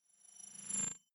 sci-fi beep fade in high

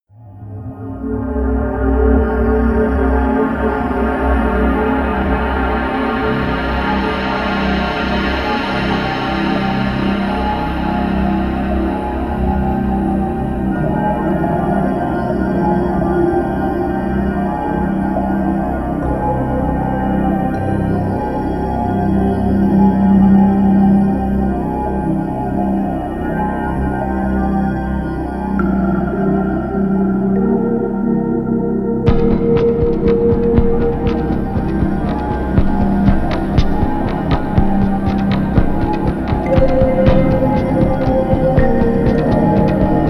sequence underground railroad

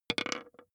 dice small board

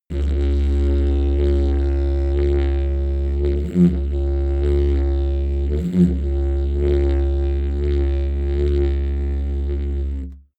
sequence didgeridoo single tone sequence

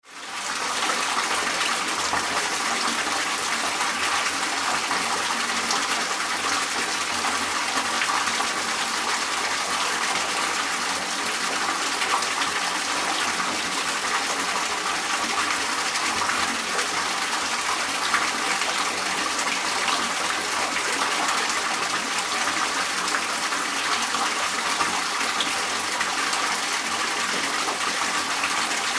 water filling in

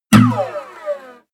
string slide down hit

